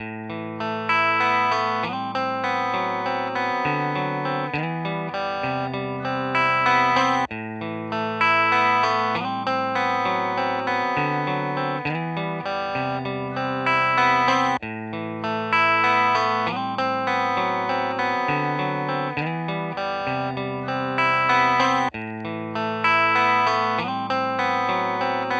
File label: Fender Deluxe Reverb; Audiovergleich Mooer Nux